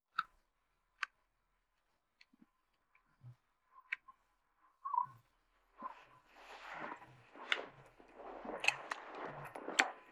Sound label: no vocals